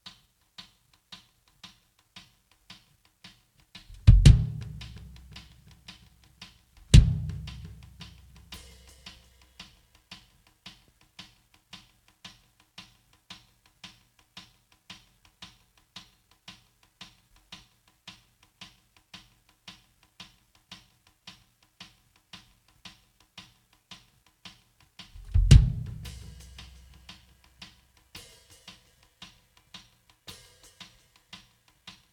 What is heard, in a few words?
drums